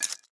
points donate

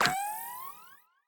zap activation

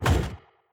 explode trail

